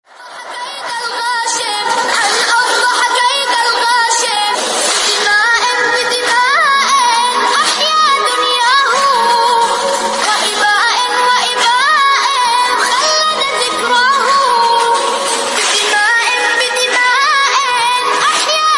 Arabic Naat Ringtone

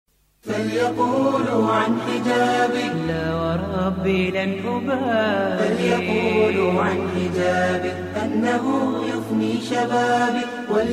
Ringtone For Girls

